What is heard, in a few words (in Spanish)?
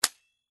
juguetes juguete